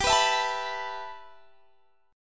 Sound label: G 6th Add 9th, Guitar, Standard; G6add9 strummed